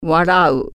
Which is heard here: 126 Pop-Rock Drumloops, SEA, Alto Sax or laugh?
laugh